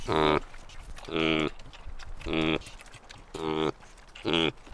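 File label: Gazella subgutturosa juvenile nose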